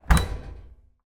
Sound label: dryer machine door open with beep; household; zapsplat